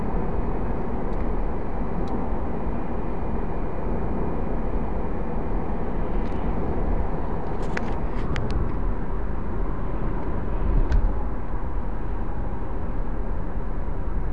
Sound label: whistle chirp hum whistle, c-class w204